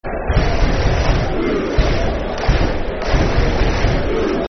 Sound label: Clap Blues, Football Chants; Birmingham City Bcfc